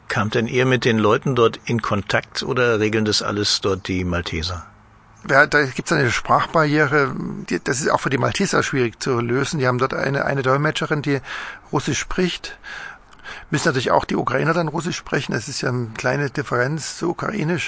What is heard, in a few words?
classic brass